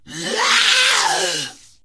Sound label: Wound Crit; Ionar